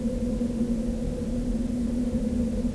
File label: night wind